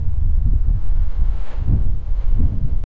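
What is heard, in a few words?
sound of rhythmic footfall, beach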